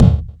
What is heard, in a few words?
DRM E4, YAMAHA R5+RX; Best Service ProSamples vol.55 - Retro Sampler; AKAI